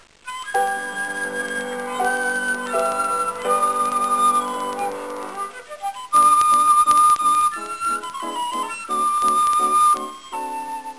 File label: soloflte